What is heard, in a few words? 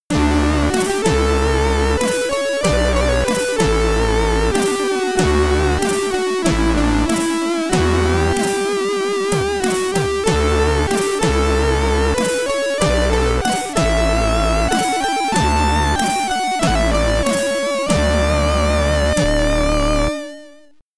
Ending (SID Stereo); Alderan; Commodore 64